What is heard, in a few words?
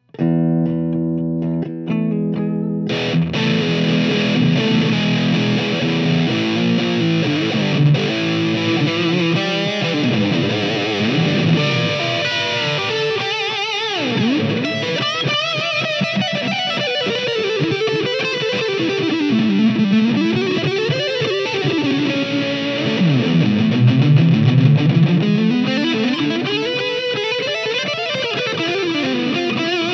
Tasty High Gain; TopFuel